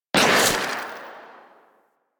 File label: Crossbow Whoosh, weapons; Garuda